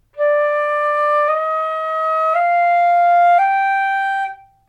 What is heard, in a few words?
flute